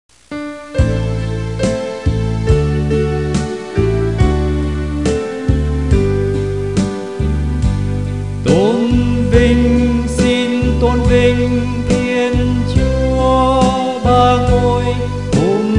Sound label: BASS